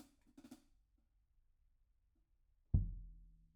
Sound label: Room